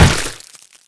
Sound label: hit wood